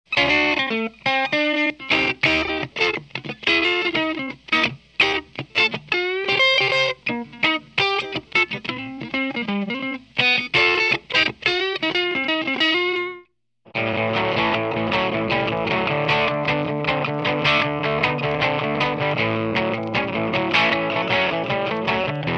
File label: dirty AC-30